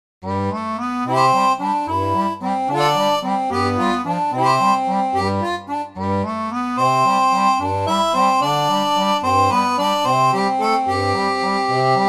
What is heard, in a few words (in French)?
orgue barbarie